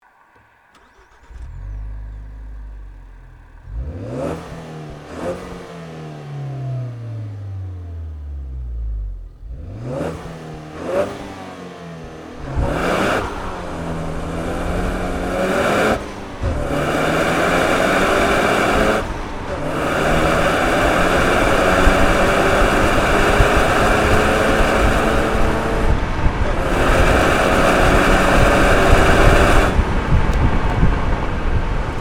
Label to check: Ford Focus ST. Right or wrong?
Right